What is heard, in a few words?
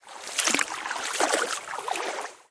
water wade